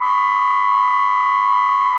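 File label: emergency alarm in distance; safety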